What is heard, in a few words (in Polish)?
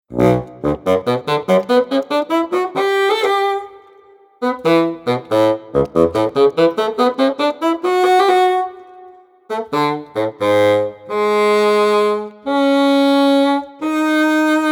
Fagot